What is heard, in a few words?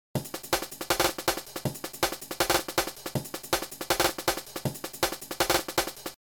Voice Group; drum kontakt; advanced drum editing in kontakt